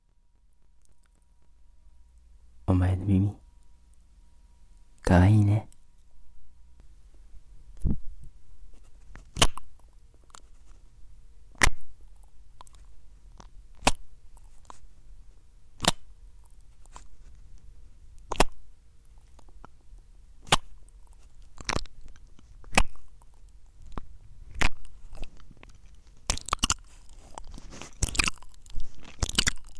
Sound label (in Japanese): 耳舐め, ASMR; かぼちゃのお化け×オオカミ男 仮装でおねだり子作りエッチ